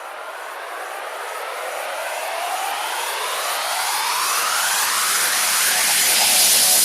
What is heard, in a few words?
Short Uplifter